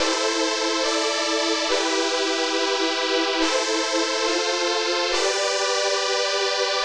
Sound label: TECHNO PAD 140; Keys